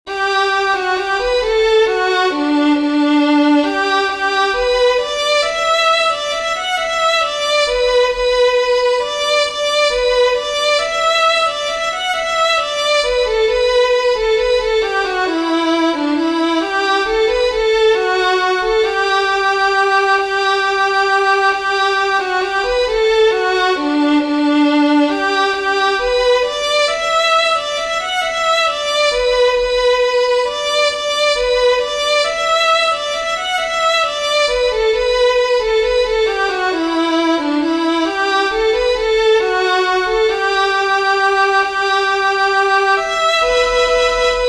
WALTZ, fiddlers; OOKPIK; southern kings fiddlers

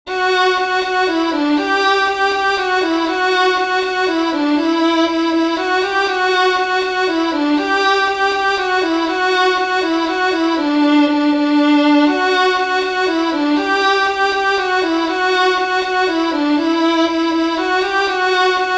SHORT BOW JIG, fiddlers

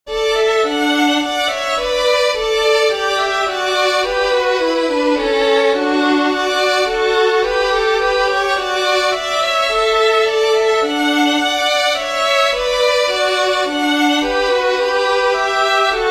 SHEEBEG AND SHEEMORE with harmony, fiddlers; southern kings fiddlers

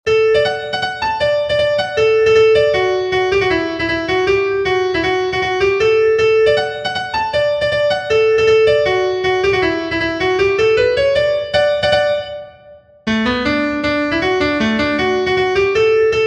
TWO STEP, fiddlers; LOGGIEVILLE; southern kings fiddlers

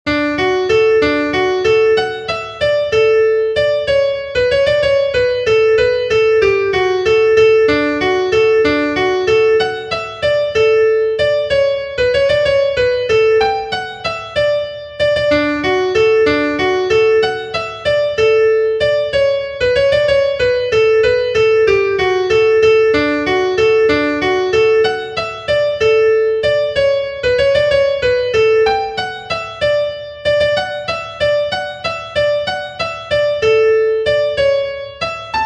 WALTZ, fiddlers; southern kings fiddlers; JUST PASSING TIME

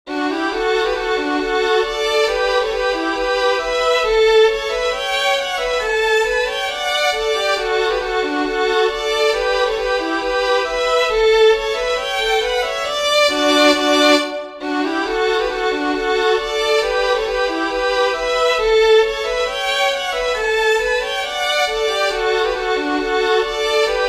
POLSKA with harmony, fiddlers; TYSKA POLSKA

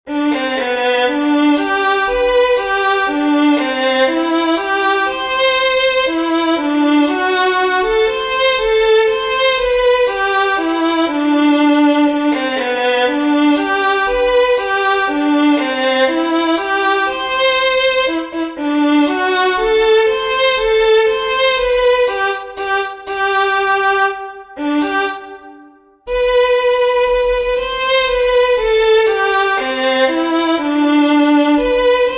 fiddlers; southern kings fiddlers

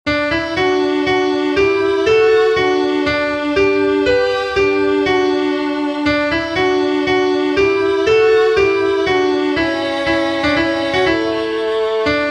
WALTZ, fiddlers; CARDIGAN RIVER

